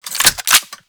Glass Shotgun Reload C; Warframe; Tenno

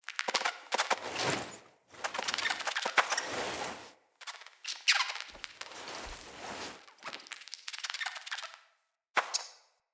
Interact Pet Rat

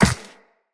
Walk Ice, Footsteps; Operator

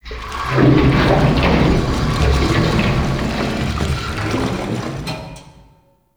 Metal Pipe One Off; Ambience; Props; Warframe; Entrati

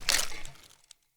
Foley Jump; Enemy; Corrupted Sproutfolk

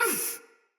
Pain Soldier Mace Agent Female; Battle; Sinecure Melee

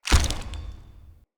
Foley Land; Enemy; Characters; Corrupted Sproutfolk

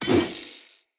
Lightning Grenade Projectile By; Weapons; Enemy

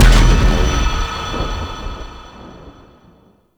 Void Dragon Weakpoint Destroy; Enemies; Gameplay; Warframe; Duviri

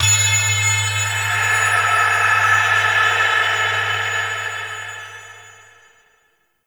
Breathy Bell; Warframe; Entrati

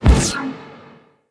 Iron Eruption Blast Cast; Warframes

